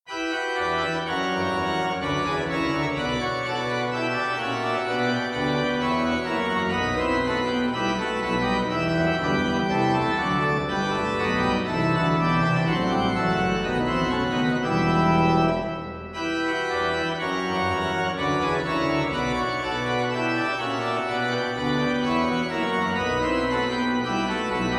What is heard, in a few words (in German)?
Orgelbuechlein; BWV 625; Christ lag in Todesbanden